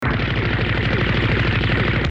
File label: rapid fire